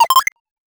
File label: short ui alert sound